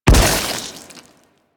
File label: Modular Secondary Barrel Explosion, Weapons; Warframe; SU Modular Guns